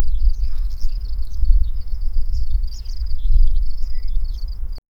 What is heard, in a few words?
low poly style farm crop